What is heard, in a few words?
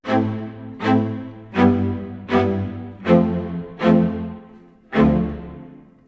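80 strings low, A minor, Orchestral arrangements; PowerFX - Downtown Orchestra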